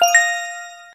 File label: auto